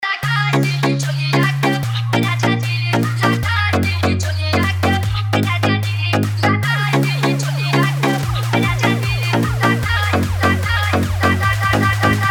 Transe Edm Transe Mix

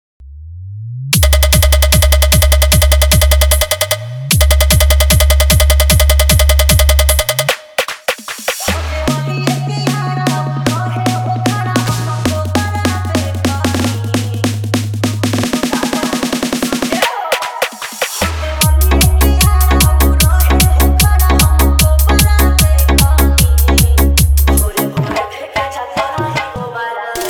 Blast EDM Drop Mixx

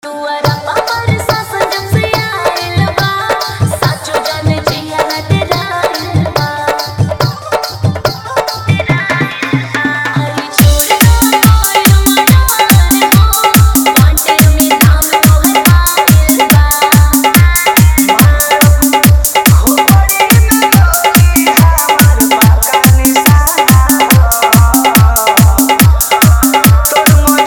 Bhojpuri Hard Bass Mix

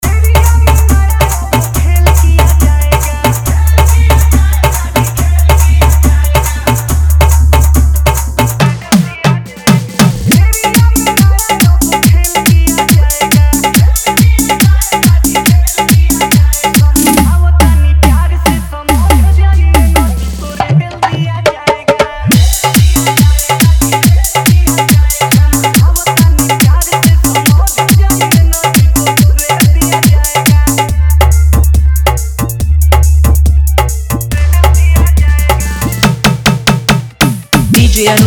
New Viral Bhojpuri Fully Dhollki Bass Remix

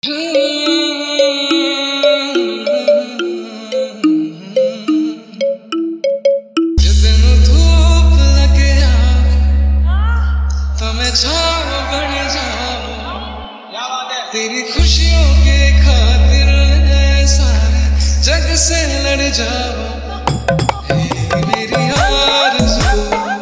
Dholki Jhan Jhan Bass Beat Mix

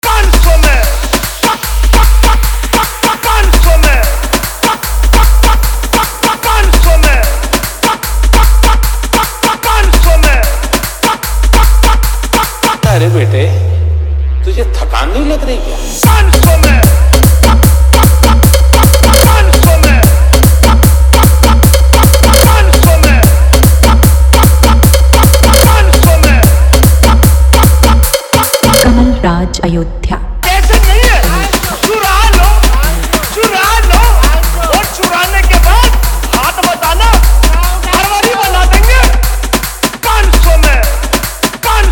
EDM Trance Bass Remix; Dj KamalRaj Ayodhya

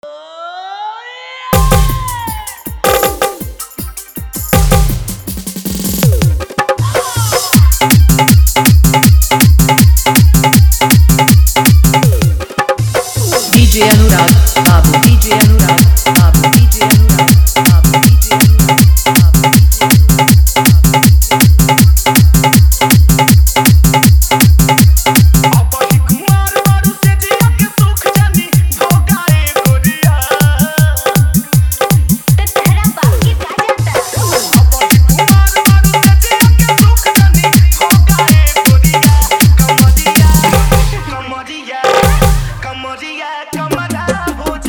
Full Dhollki Bass Mix

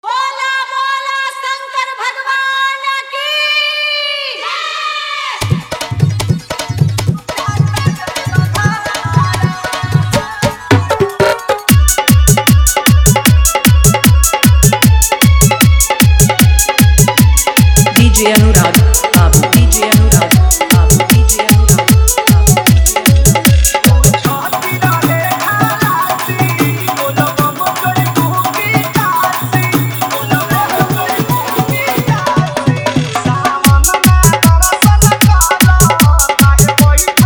BolBam Dhollki Bass Dance Mix

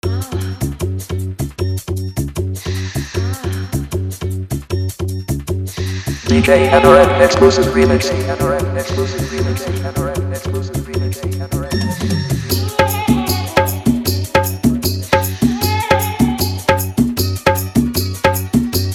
Bhojpuri Trending Fully Dhollki Bass Mix

